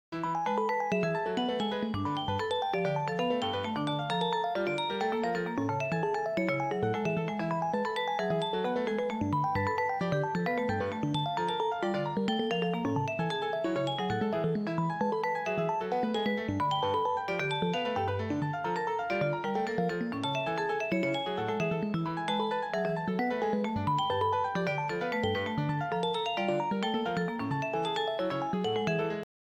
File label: harmony example